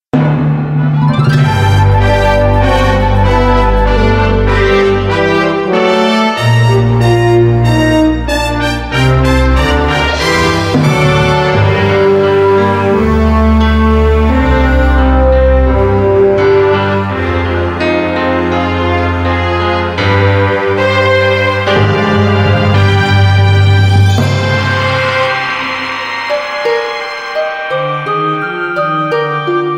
INSTRUMENTAL

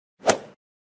Golf Ball Hit Sound Effect

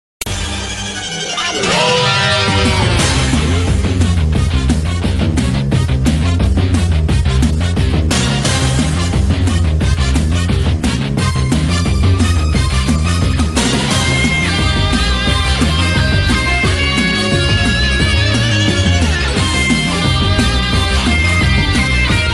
Pokemon Soundtrack battle sonido batalla

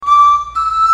flute

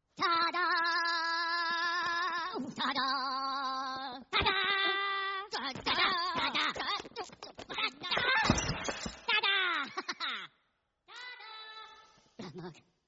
Tada; Minions

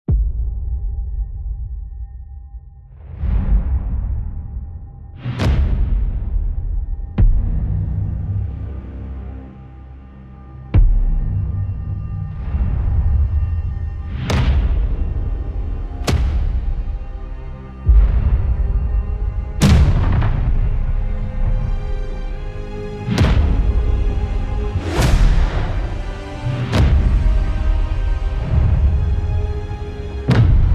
Cinematic Strikes Sound Effects Trailer

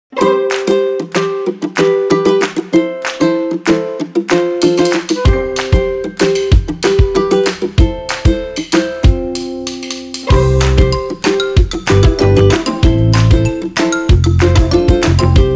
Children s Music Instrumental Music For Kids

